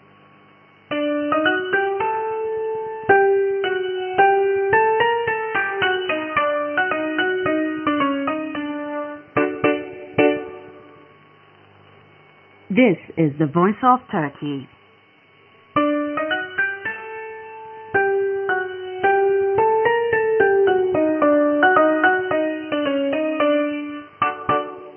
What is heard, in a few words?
Turkey engl 9830kHz